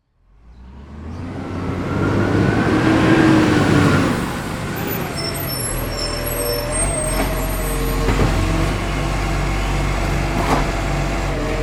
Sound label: transport truck garbage arrive mechanical arm empty bin away